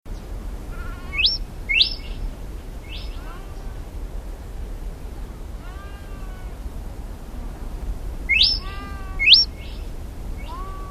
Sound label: Andean Tinamou; S. America